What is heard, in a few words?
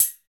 HH HH, Dance